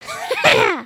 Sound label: slash ninja teleport; emotes